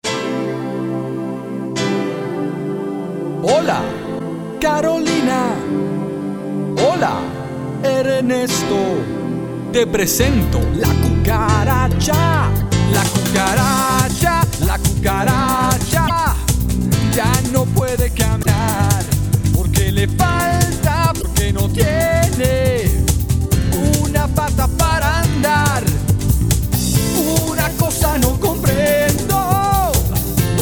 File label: cucaracha